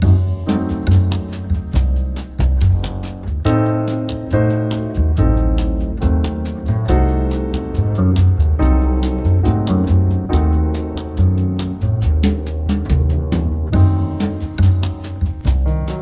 dim, scales; G dim

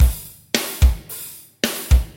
metal

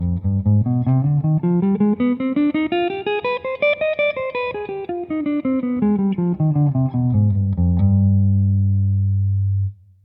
G harmo, scales

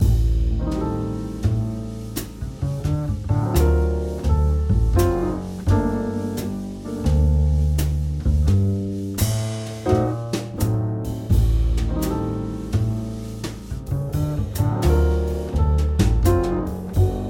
jazz 251 min; G harmo, scales

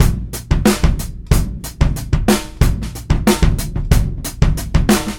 whole 92, Drum Loops Funk

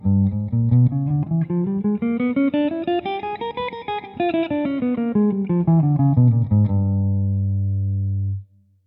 G dim, scales

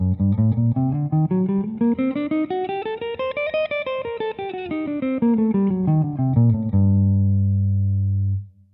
G harmo, scales

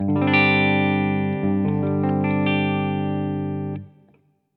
Gsus4, chords pop

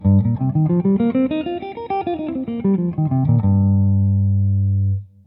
G penta; scales

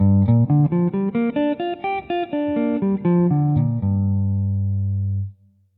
Arp min7; scales Gmaj

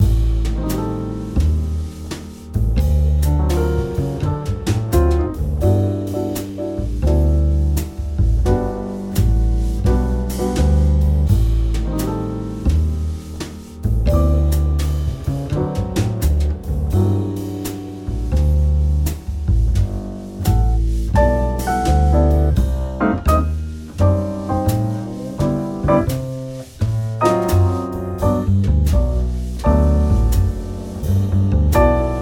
jazz 251 maj; G harmo, scales

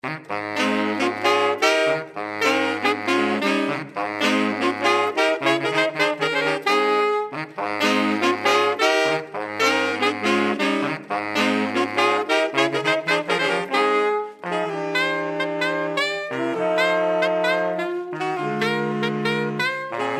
sax; ensemble de Saxophones

